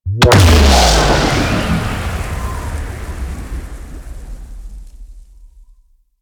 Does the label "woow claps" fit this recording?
No